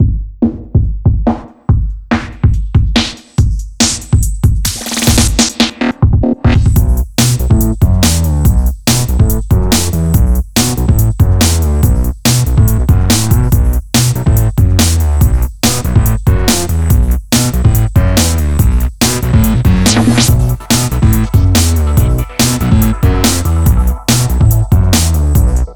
game music regular

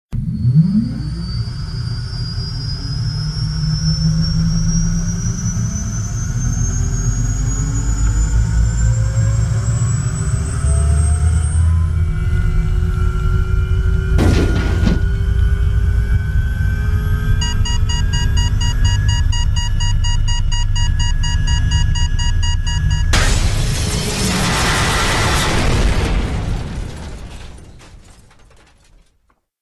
Helicopter Crash

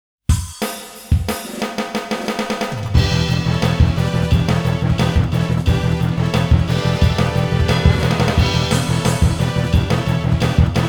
Final Match Goal Rush Tournament Map BGM

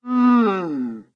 Bull Scream

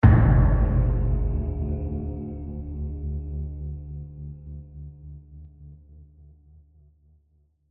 Tension Match Over; the last of us

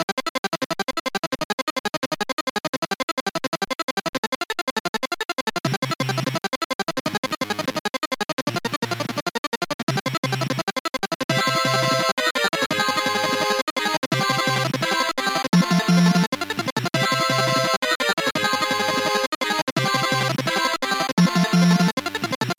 Level Theme; Fix-It Felix Jr.

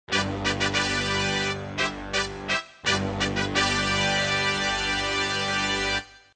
Fanfare

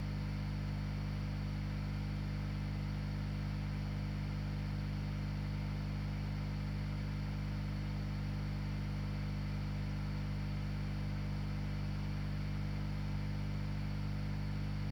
light buzz

